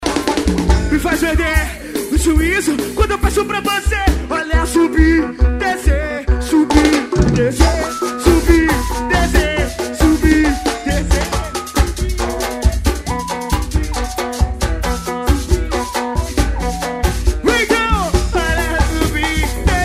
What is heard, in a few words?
swing